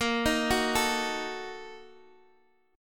A sharp Major 7th Suspended 4th Sharp 5th, Guitar Standard; arpeggiated; JGuitar